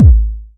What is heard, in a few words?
verby kick one shot g sharp key